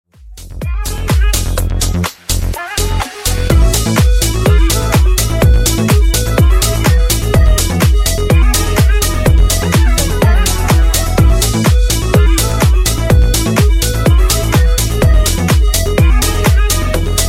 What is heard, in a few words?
Funk 125Bpm